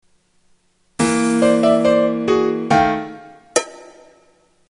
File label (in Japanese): quiz hazure